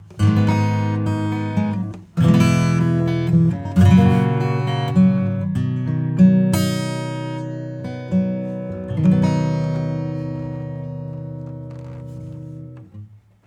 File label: acoustic; smart EQ 3